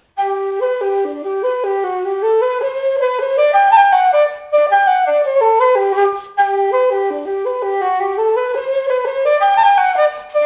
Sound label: Flute, Traditional Irish Music; Peeler's Jacket; Reel